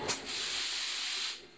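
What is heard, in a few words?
Compressor End, Train; OpenBVE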